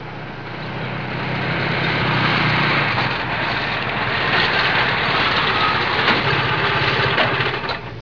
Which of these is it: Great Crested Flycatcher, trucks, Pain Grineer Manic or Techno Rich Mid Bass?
trucks